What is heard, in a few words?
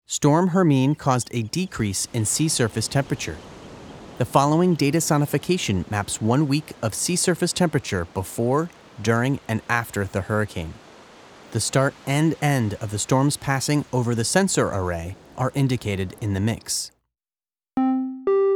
Sea Temp Sonification; Storm Hermine Audio Display